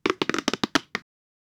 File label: cartoonish and funny